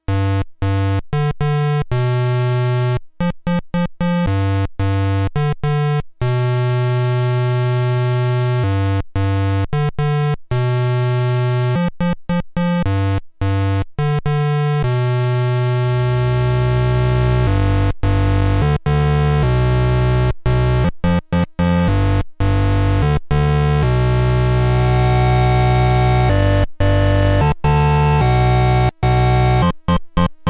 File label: arduino Synth